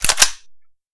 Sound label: m16a2 bolt